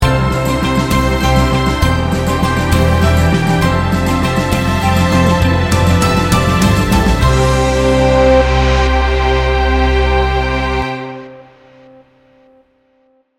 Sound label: heart and inspiration sting bumper